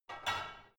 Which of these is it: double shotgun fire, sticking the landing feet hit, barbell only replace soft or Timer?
barbell only replace soft